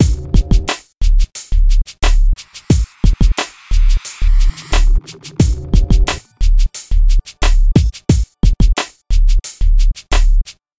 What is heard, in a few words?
drumloop